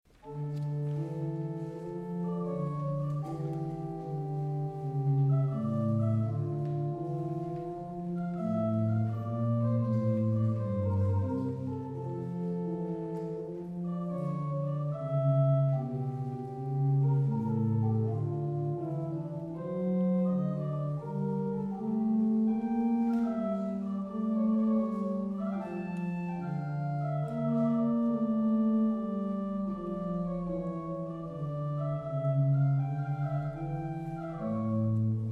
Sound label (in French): Concerto orgue et orchestre Adagio; Haendel; op 4 n4